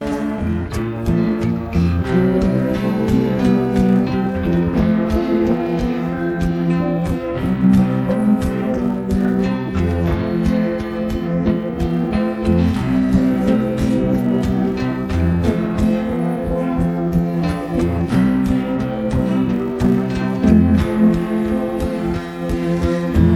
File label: instrumental